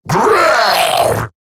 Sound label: Death Eximus Grineer Male; Battle Barks